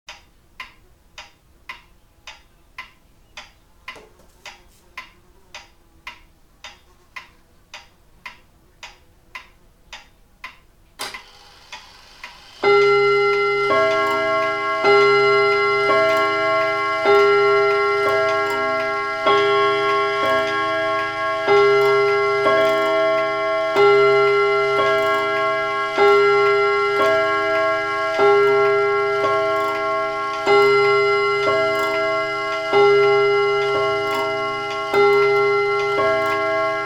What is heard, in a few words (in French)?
Vieux carillon